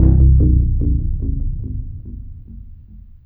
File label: BASS, Drum & Bass, 150 BPM; Ueberschall; Drum & Bass Resonance